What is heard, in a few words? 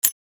Grenade safety pin pull; Military Equipment